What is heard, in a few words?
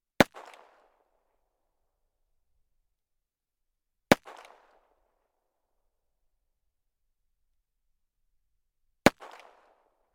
gun 1911; weapon; still north media